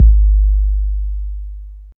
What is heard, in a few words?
subby kick drum g sharp key